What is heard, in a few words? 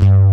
poly bass; Pro-Rec - Synthsonic